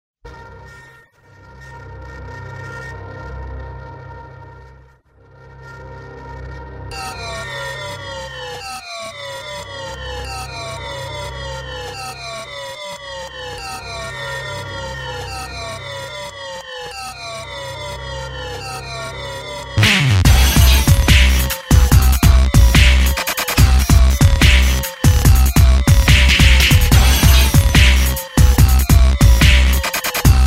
instrumental mix

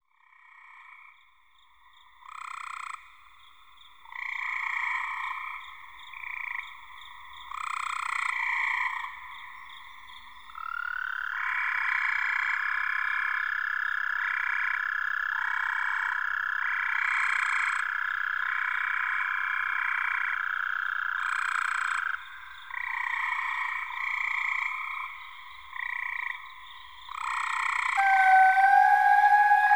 loud frogs

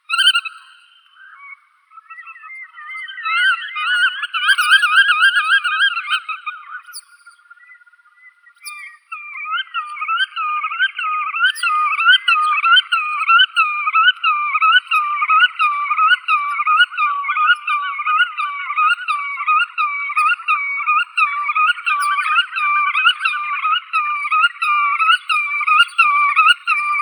veretennik bolshoi limosa limosa

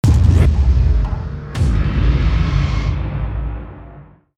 amp hit complex long

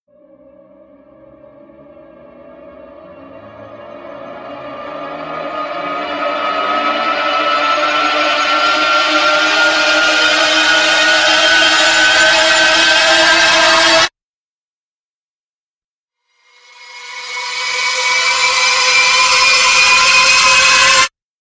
risers evil strings

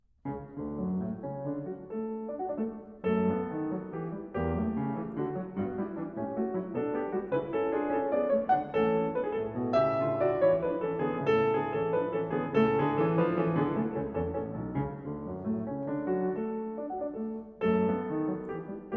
piano sonata in d dur finale presto; joseph haydn; no 61

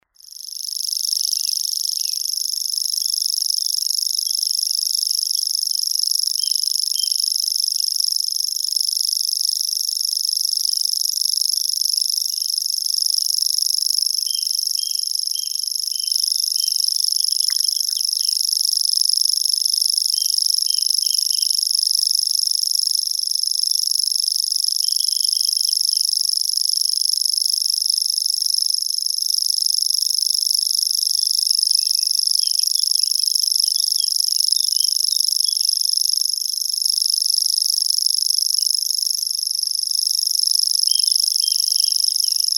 sverchok obiknovennii locustella naevia

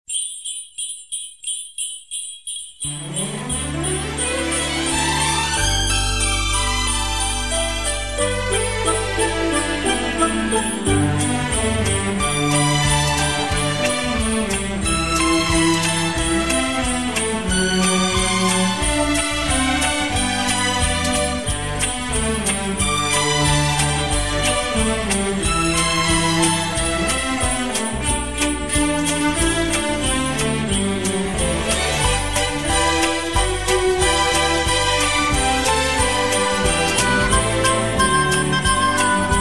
orchestra jingle bells

